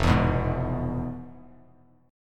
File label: F Minor Major 7th Flat 5th F Bass Standard, chord; FmM7b5 strummed; JGuitar